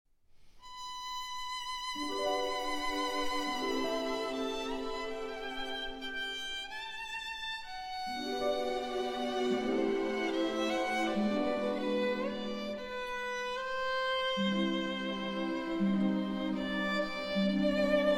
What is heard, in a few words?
Version for Violin & Orchestra; 3 Visions No. 2, Summerland